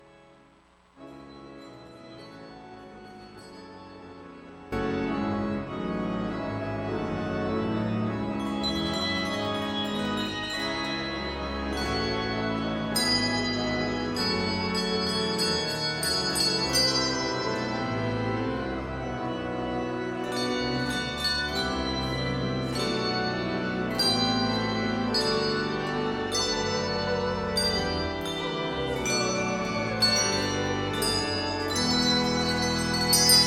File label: Sanctus, Carillons